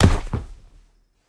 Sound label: Player Fall Bed; Game rip; MiSide